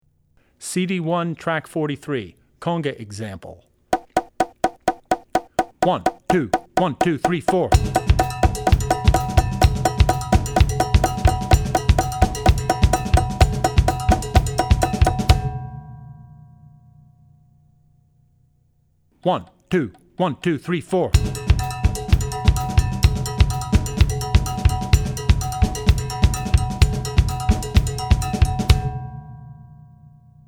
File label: Conga; Drummer's Bible Tracks